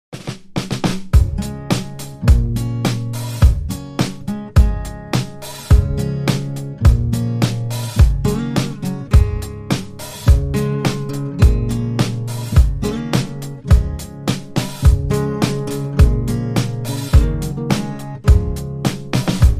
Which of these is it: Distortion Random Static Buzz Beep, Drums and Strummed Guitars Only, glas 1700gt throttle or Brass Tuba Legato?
Drums and Strummed Guitars Only